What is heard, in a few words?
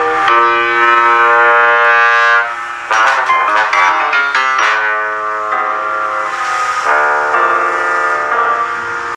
Piano and Bassoon Music